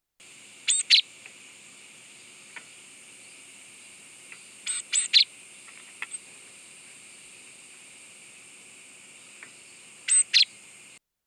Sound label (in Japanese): シジュウカラ, 【警戒】; 何かに警戒; Parus minor; 餌場で採餌 中のシジュウカラが外のやや遠くの鳴き声で突然停止しその後飛び立つ。